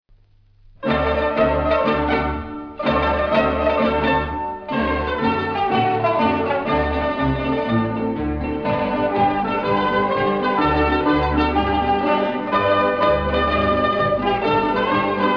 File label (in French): hymne